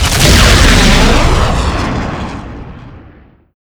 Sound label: thunderbolt fire